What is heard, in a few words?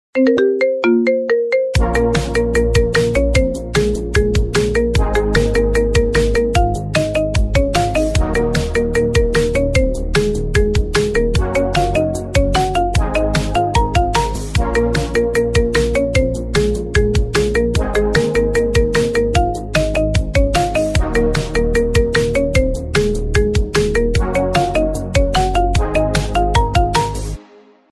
Marimba